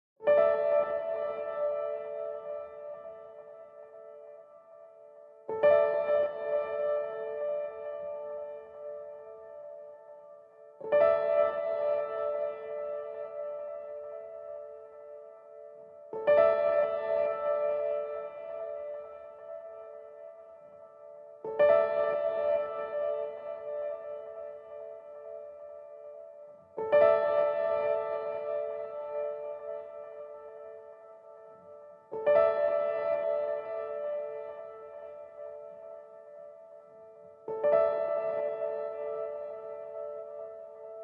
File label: Echoing Piano